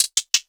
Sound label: hat down, Rolled Hat; Hip-Hop; Drum One Shots; SD CAICOS Hip-Hop Sample Pack; Slate